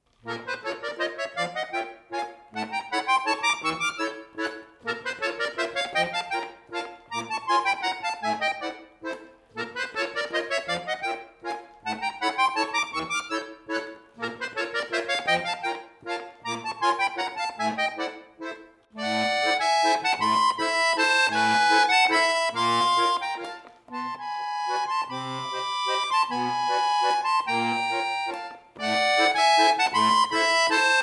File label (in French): musette miniature valse